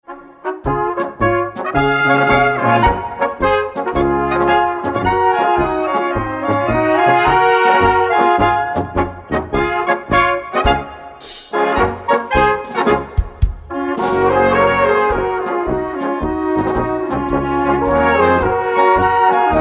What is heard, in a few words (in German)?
Blaskapelle